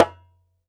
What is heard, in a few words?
ASHIKO, African Perc; E-MU Producer Series Vol. 7 – Old World Instruments